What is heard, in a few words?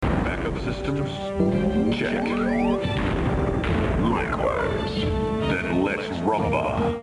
rumba